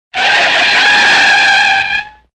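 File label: sounds of car brakes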